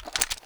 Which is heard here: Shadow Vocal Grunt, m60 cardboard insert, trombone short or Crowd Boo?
m60 cardboard insert